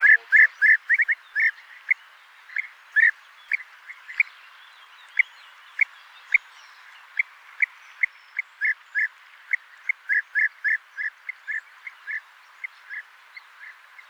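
call, bee-eater, flight calls. Note two different call types, the most common and a less common one; merops apiaster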